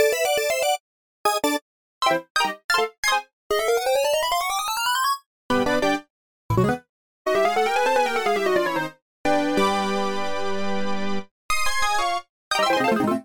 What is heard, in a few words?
retro game musical tones; zapsplat